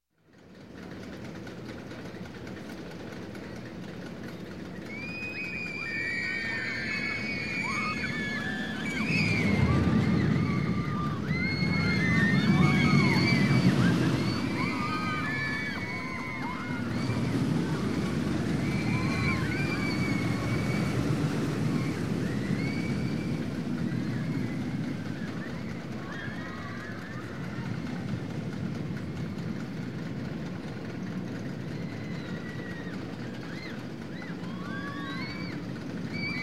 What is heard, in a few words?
Roller Coaster, Large Roller Coaster Run; Sound Ideas 2000 Series